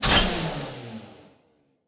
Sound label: gun overheat